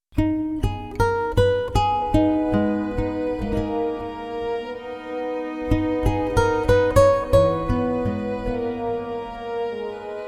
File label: Orchestration